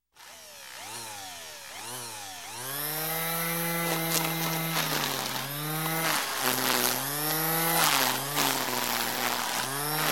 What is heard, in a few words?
Lawn Trimmer Electric Start, trim, Stop, Gardening; Sound Ideas; Series 2000